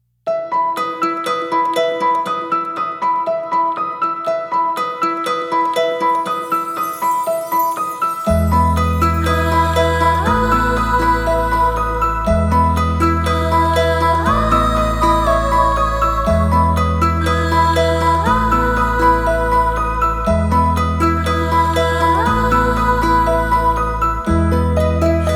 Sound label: cinematic fairy tale story short